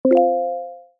UI voice success; AMAZON

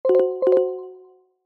HIGH SEV ALERT

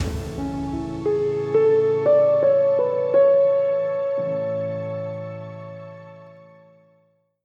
Logo Variant

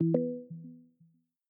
Voice Control Success; Peloton

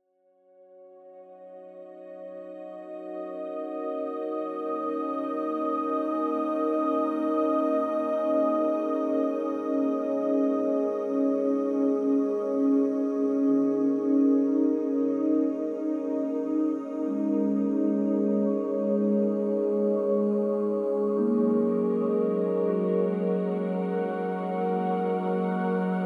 SLEEP AMBIENT; SENSE

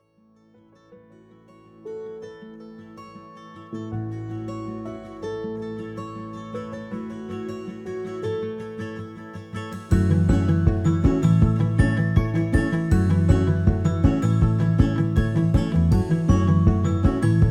Anthem

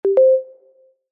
GENERAL ALERT; WAYMO

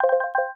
Tread Warning; Peloton

